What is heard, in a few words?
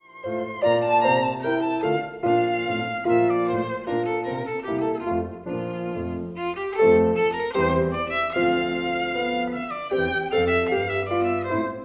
VIOLIN